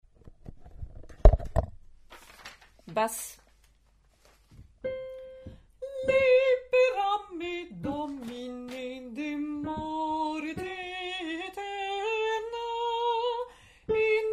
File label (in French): Basse